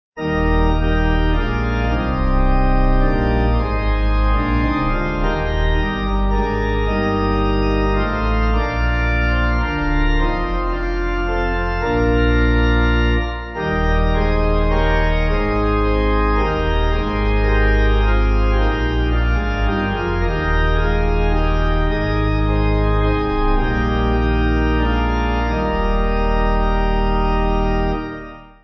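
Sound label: Pipe, Pipe Organ backing; Come Gracious Spirit; Galilee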